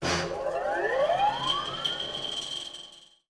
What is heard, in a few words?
Fast Whine Up Cartoon, servos